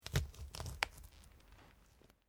LIVE PLIABLE CRUSHED, Organic Foley sounds; Injuries; Combat

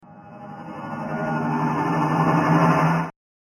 Horror Building Up The Tension; Scary Soundscape Sound Effects

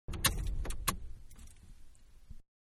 Big Car Turn Off Interior; Cars; Vehicles; Manhattan Production Music

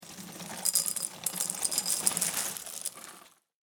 Food Cereal Pour Into Glass Bowl; Household

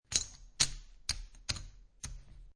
Poker Chips Stack Chips On Top Of Each Other; Gambling Sound Effects

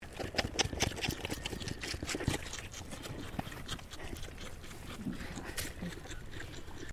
Animal Goat Boer Kid Bottle Feeding; Animals from Edgar's Mission farm in Victoria; Goat Sound Effects; Foundation1

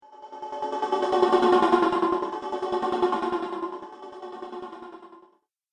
Throbbing Ghost Pulse; Sinister, Scary, Spooky Or Just Silly

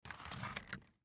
Toy Package, Toy Package Cardboard Empty Pick Up; Toy Package Cardboard Empty Pick Up Carpet; Foley Toy Package Action Figure Plastic Cardboard Small Empty Pick Up Carpet; Blastwave

